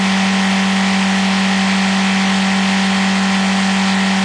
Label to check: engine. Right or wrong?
Right